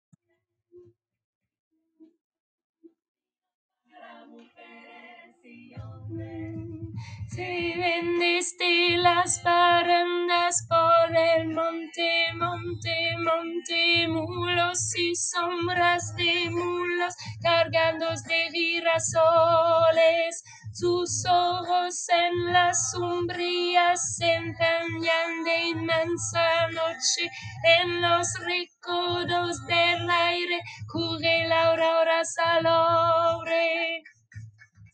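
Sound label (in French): Refrain thème voix middle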